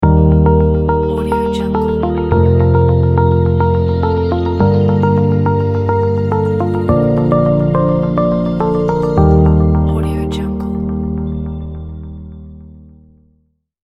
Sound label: flat logo reveal; red-ninja